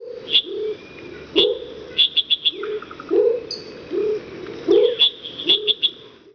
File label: frog sound